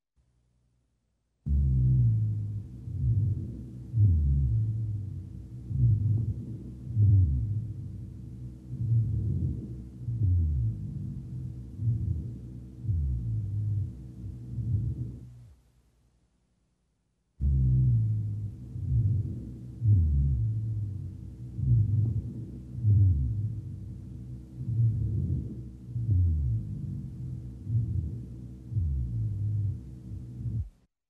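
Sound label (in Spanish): Roncus lóbulo inferior derecho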